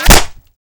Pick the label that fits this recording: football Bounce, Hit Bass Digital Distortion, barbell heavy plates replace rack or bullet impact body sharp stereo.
bullet impact body sharp stereo